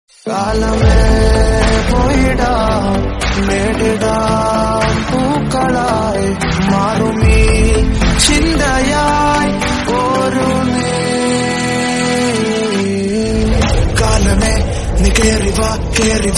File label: kalame poyidam ringtone